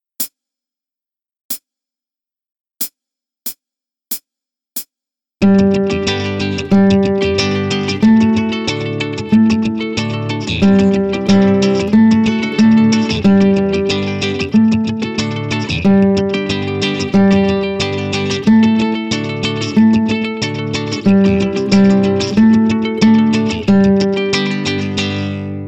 Die Note a, Guitar; Play Guitar Gitarrenschule 1

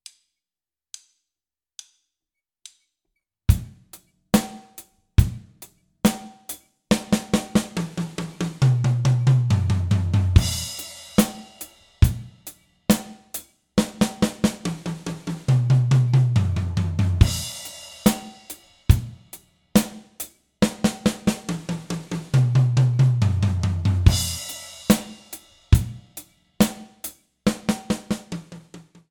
1-taktige Fills; Bum Bum Tschack 1

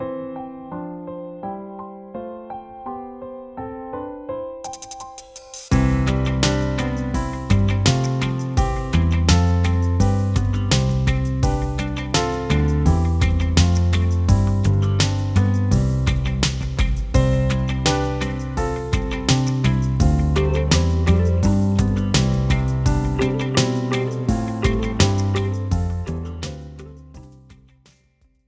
Die Tonleiter C-Dur; Floetentoene; Meine ersten Floetentoene 2

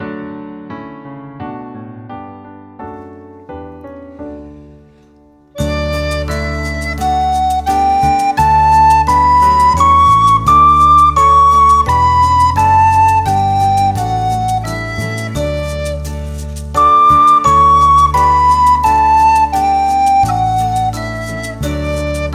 Die Tonleiter D-Dur; Floetentoene; Meine ersten Floetentoene 2

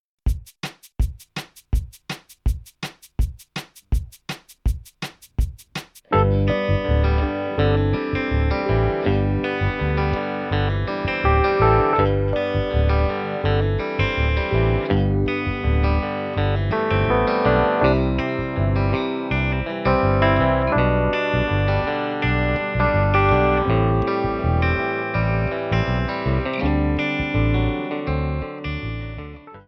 Countryfeel; Bum Bum Tschack 1

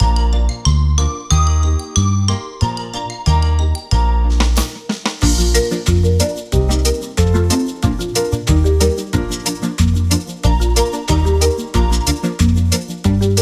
Das B; Floetentoene; Meine ersten Floetentoene 2

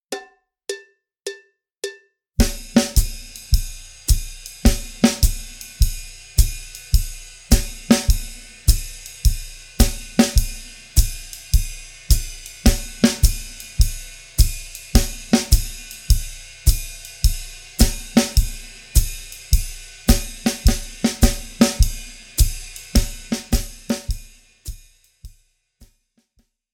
Swing-Phrasierung; Swing-Phrasierung 2; Schwarzes Album; Bum Bum Tschack Jazz Latin

